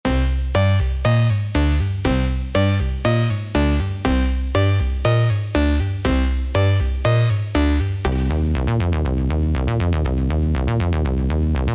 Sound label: Random LFO Stepped